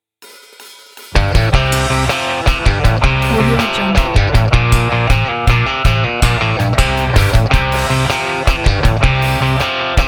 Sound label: Country Rock n Roll